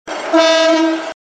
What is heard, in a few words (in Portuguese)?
Buzina; Comboios